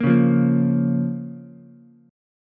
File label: Bb Minor, Ukulele; 3,1,1,1; strummed; chord shape